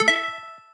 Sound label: Stylish Move